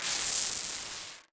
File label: Title Transition Feedback High Pitched; Production Element